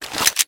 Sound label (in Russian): taktichnaya perezaryadka pistoleta